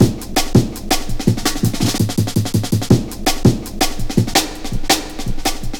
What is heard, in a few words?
Pride, Drum Loops, 165.5, Jungle; Zero-G Jungle Warfare 1, 2 & 3